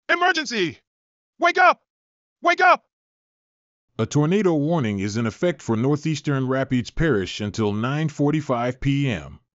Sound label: tone alert; emergency alerting system; AlertNow™